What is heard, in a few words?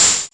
electro perc; Hip Hop; STYLE KITS (Hip Hop, Etc); Precise Electro Percussion